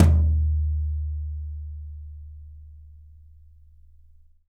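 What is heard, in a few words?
Yamaha 14X14, brush, drums; Big Fish Audio; Ross Garfield Drums VOL-2; T-YAM BRUSH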